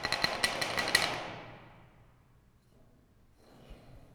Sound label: Stairwell Stick Scrape